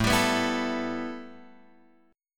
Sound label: A 7th Suspended 2nd A Guitar Drop D, chord